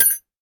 impact metal small; sound effects one

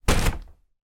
paper lampshade sphere type push with hand

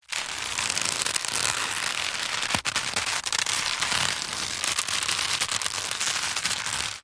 rope thick old tension movement creak stress; foley

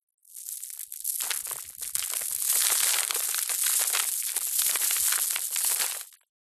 dirt debris small fall hit ground

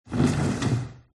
plastic chair; fox audio

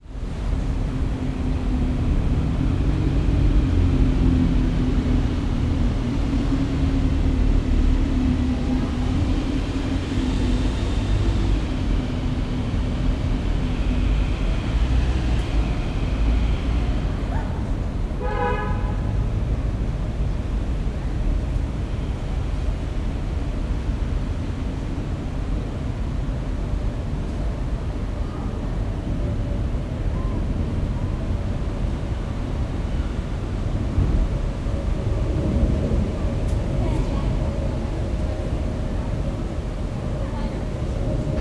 ambience city london uk park millbank gardens

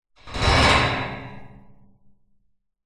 metal bars object run across rattle clunk

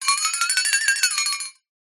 cartoon flexatone wobble shake unsteady tone; zapsplat

